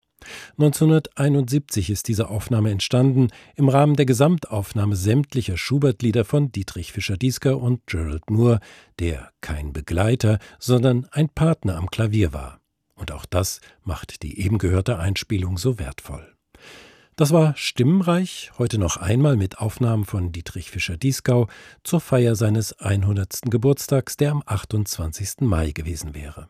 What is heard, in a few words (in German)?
Vokalmusik